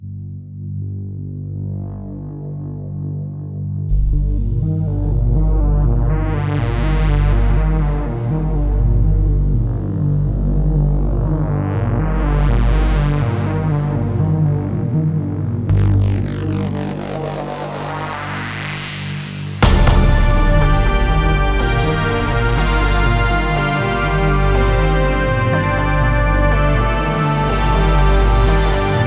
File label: Cinematic Loops